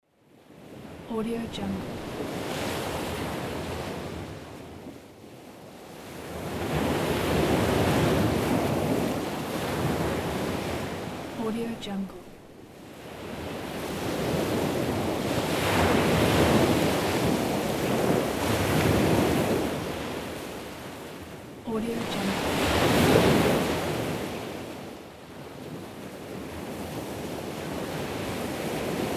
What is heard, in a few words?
ocean waves; wavbrulee